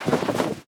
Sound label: Tnt Box Parachute Open